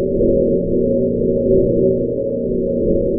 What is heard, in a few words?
moan